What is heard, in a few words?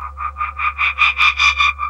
noise 2, sound noise; NOISE+ALARM